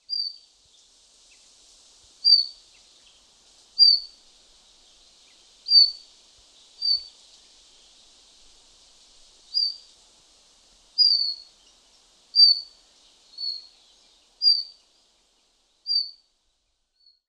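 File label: Ijima's Leaf Warbler, miyake; iijima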